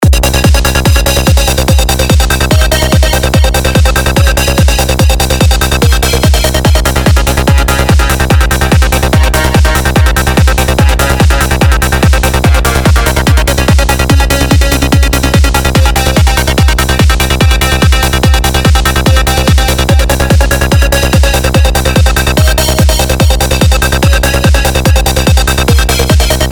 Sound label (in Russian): Psy, Goa ритмические синты; Из видеоурока